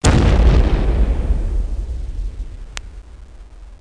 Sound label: space